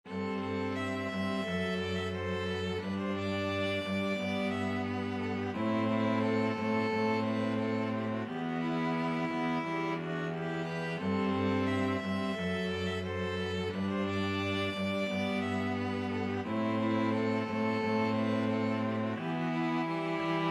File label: string trio